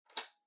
Portable Cassette Far Play

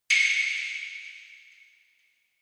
Accent

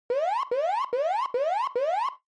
Warning Siren Low Mid

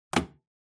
Cell Phone Hardware Put Down Table

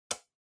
Wall Clock Toggle

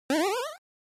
NES General Pitch

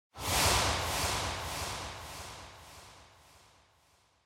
Scene Flashback Short Delay

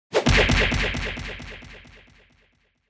Hit Punch Low Delay

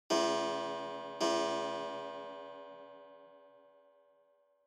Pendulum Clock Chime Mid

